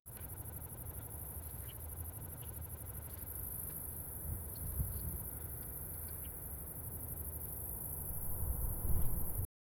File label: field recording